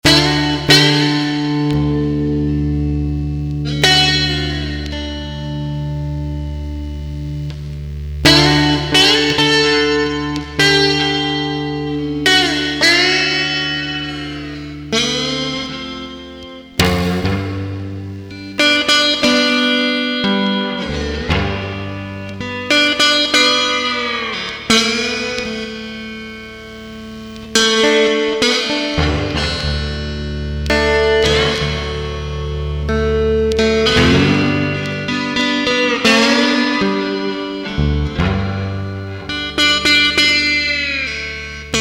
bad blues

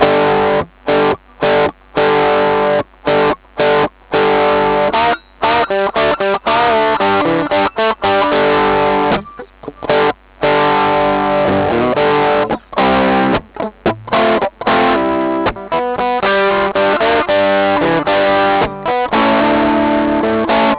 honky

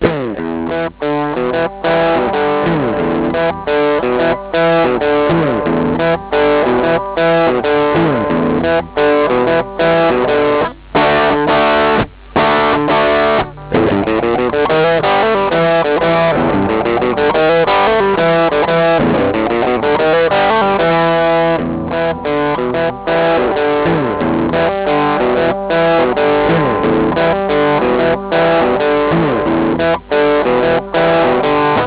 break

